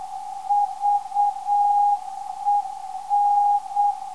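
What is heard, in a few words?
VEN 379kHz, non-directional beacon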